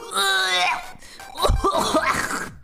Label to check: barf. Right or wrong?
Right